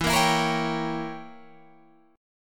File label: E Diminished 7th Guitar Standard; Edim7 strummed; 12,13,14,15,14,15; JGuitar